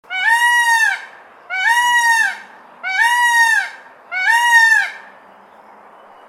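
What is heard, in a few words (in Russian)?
pavlin golos, peacock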